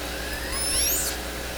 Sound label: calls, Sea Sounds